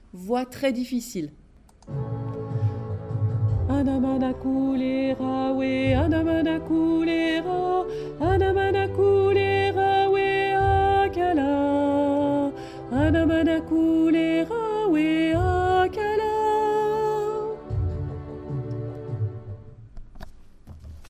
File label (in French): voix difficile